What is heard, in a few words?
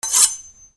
KITCHEN